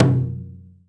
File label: taiko, toms; Real